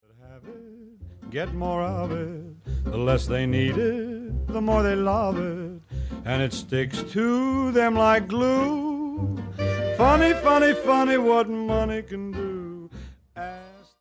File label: money song